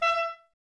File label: clarinets, Wood Winds